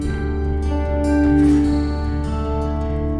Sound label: Picking G